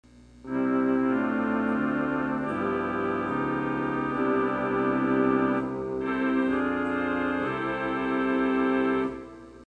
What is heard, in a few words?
Horndiap, theatre organ